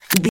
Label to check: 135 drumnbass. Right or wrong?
Wrong